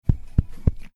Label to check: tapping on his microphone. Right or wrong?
Right